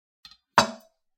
包丁をまな板の上に置く音

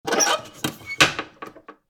古びた扉のノッカーの音; door

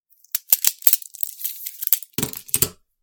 時計を外す

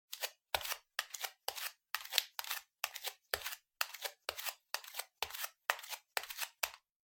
フローリングを裸足で歩く

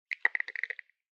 お腹のぎゅるぎゅる音

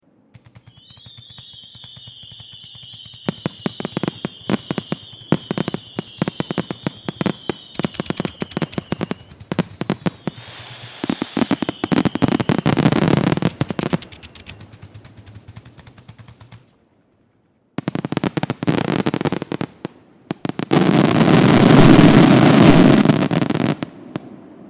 花火の音 連射; firework

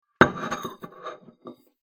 テーブルにティーカップを置く音

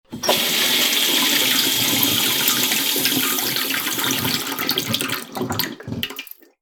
洗面台の蛇口の音

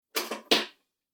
玄関のドアロックを開ける音

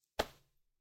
スマホをシーツに投げる音

